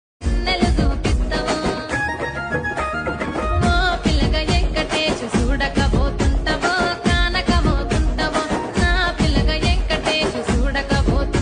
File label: love bgm ringtone south bgm ringtone